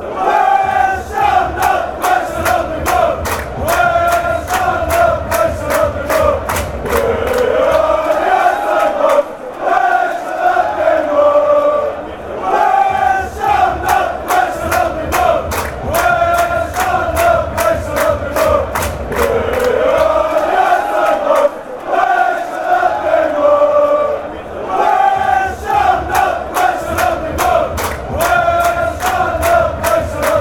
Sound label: anderlecht chant, fan chants